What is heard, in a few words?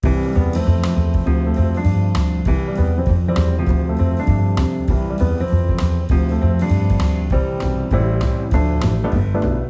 Bg Level Win Idle; Ellen's Garden Restoration; Android